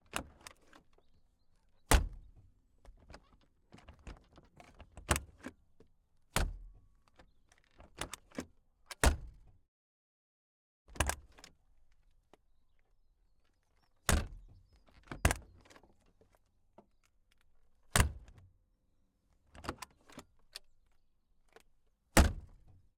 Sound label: Ford Puma WRC - Driver Door from Outside; Pole Position; Ford Puma Hybrid Rally1 2022; VAR SFX; MS Decoded